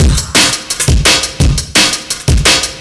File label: Terrain Break; Jungle; Breaks; Blu Mar Ten - Jungle Jungle - 1989 to 1999 Samplepack